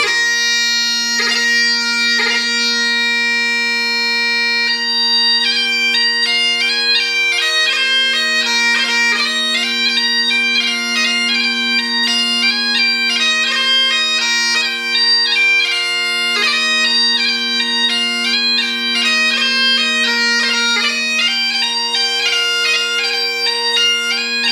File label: tune for bagpipe